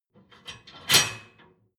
dishwasher frame fold up

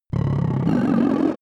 arcade growl

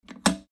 switch

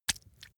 leather impact mud medium

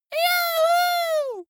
dwarf cheer "yaahu"

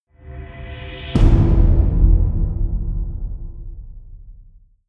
big drums long

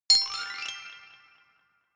notification glockenspiel sparkle slide up hard

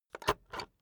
puncher punch paper

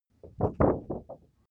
paper wobble strong

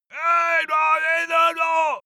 male shout angry

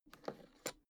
suitcase open latch lock

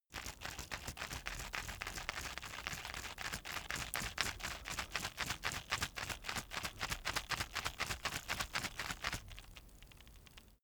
plastic drug blister shake soft

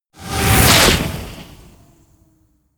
swoosh

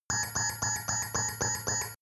sci fi alarm missile incoming

